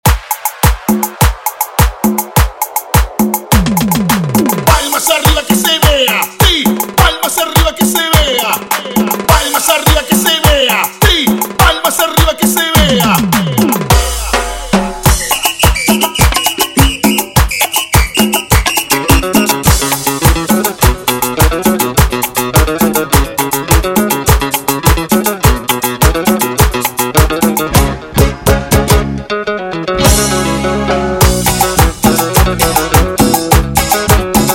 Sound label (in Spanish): palmas 104 bpm